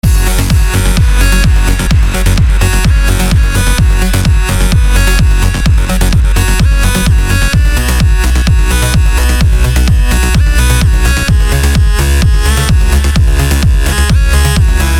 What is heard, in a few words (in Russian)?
energichnaya klubnaya muzyka